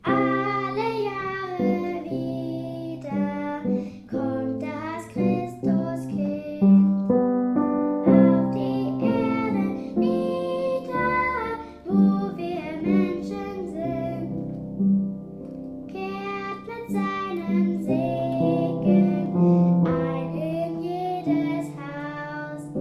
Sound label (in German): Alle Jahre wieder mit Gesang, Orchester